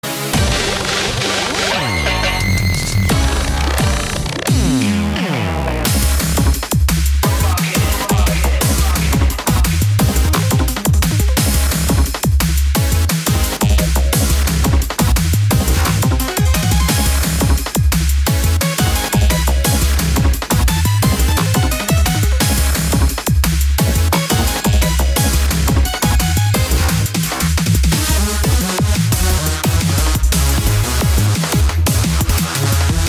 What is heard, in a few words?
Reversed drum'n'bass